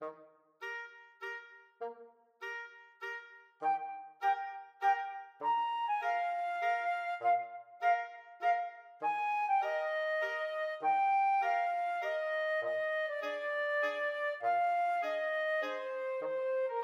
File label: flexible mixed ensemble, 4 players